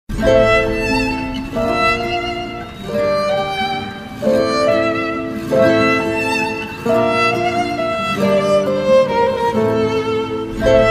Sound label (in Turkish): dizi muzikleri; seher yaman tema 1 v4; emanet legacy